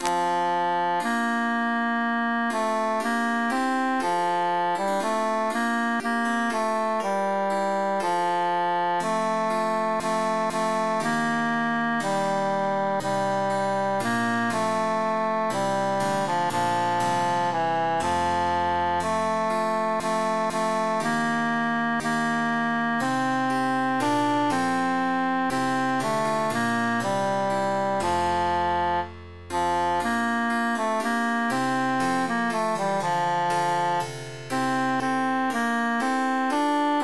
tenor emphasized

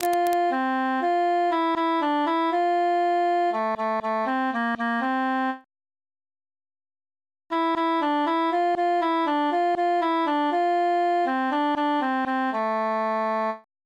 altus solo; psallite unigenito

